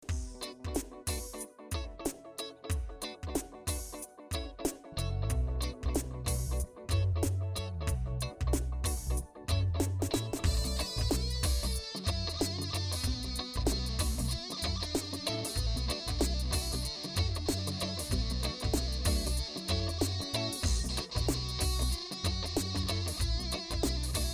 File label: reggae beast